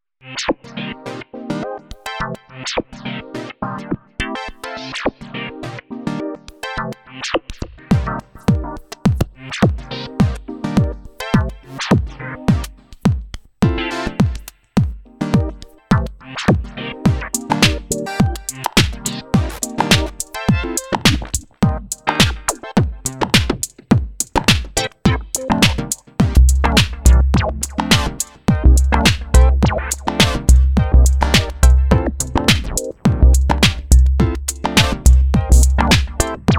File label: roland mks50 instant program changes